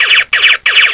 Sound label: super shot